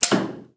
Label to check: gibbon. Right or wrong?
Wrong